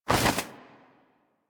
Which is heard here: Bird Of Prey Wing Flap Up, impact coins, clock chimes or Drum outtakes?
Bird Of Prey Wing Flap Up